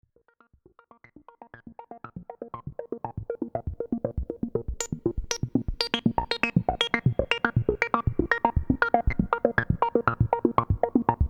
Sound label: Arp; LAMM RawArtistic PhilKullmann